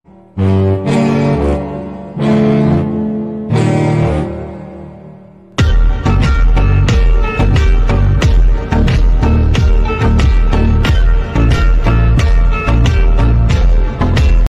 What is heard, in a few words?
slow and reverb; ktm duke whatsapp status edit status shorts